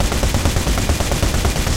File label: loop flak38, guns tanks